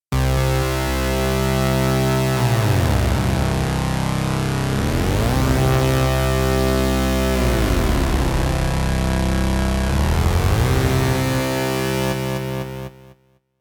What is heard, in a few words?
Weird Patch Channel 1 Wet; Channel 2; The pitch bends are done manually